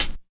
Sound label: low treble pedal hi hat single hit g key; hat samples